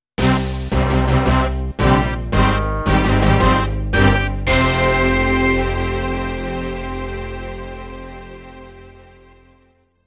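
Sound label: encounter sting announce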